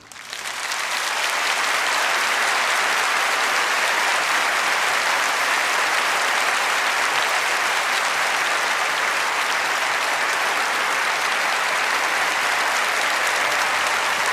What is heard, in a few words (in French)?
Applaudissements